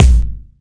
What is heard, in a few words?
small reverb bass drum sample b key